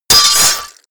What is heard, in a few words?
sword impact gore long